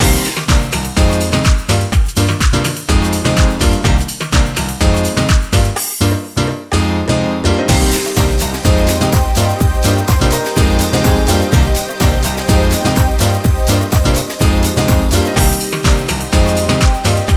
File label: Dance Menu BGM; brooktown high; playstation portable psp